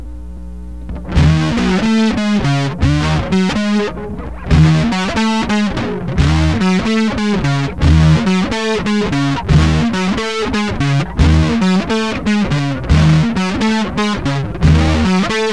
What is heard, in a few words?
guitar